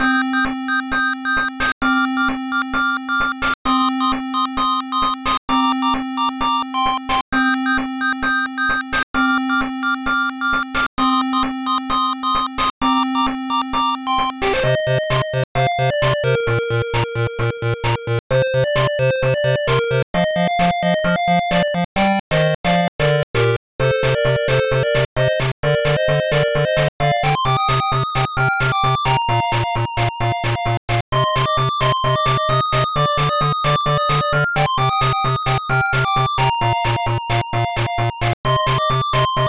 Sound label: Battle Game BGM; tokusou sentai dekaranger; pico